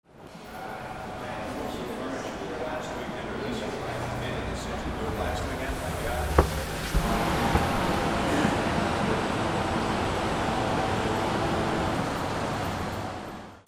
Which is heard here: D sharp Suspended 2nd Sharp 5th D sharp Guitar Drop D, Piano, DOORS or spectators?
DOORS